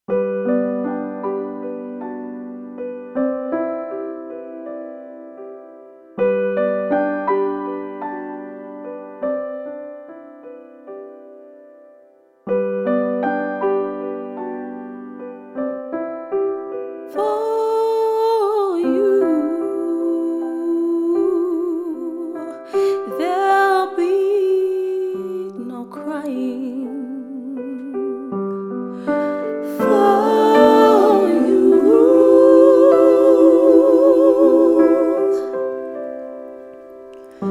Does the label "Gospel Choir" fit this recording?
Yes